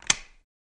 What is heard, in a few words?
Light Switch Lamp